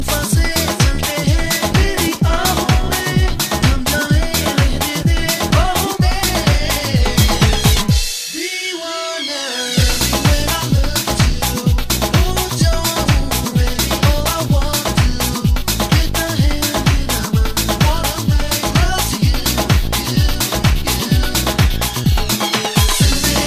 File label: Sine